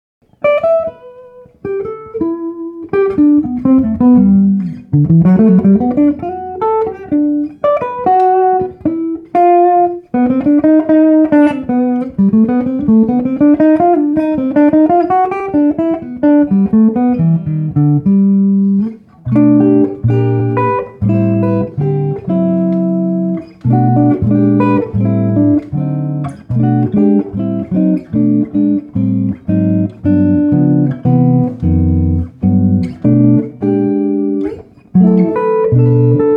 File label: semi-hollow, electric guitar; holst custom instruments